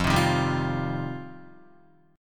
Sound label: F 7th Suspended 2nd Guitar, chord; strummed